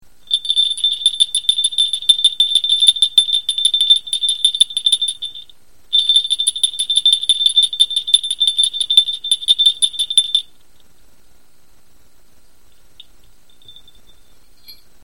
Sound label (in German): lotusschelle blau